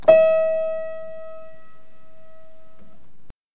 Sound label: Piano